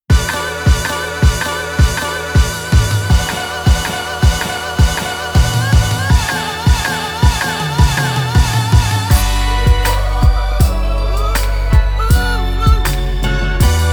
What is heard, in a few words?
Instrumental